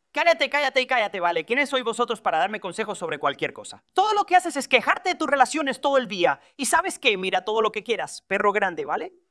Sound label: yell